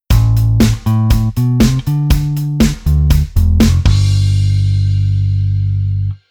Bass Arr Move Oct CS

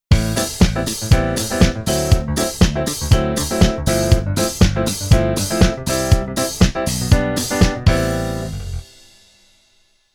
Keyboard Pf Funk

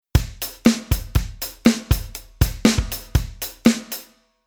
Rhythm Funk

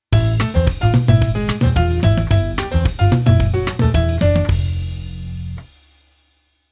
Keyboard Pf Latin

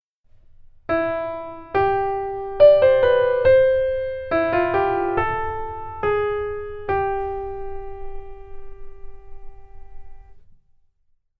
Keyboard

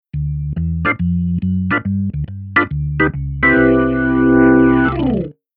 Keyboard Organ

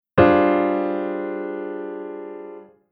Chord Hybrid

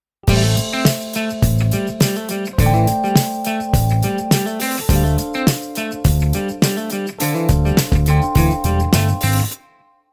guitar pattern

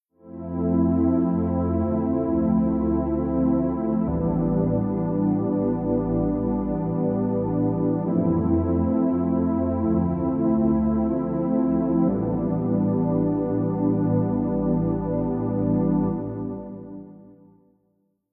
Synth Pad Chord

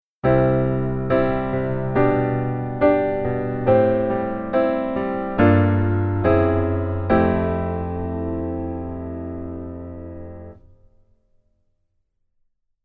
Keyboard

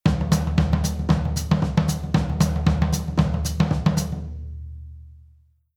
Rhythm Jungle Beat

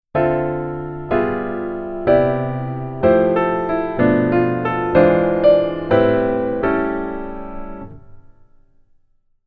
keyboard top note

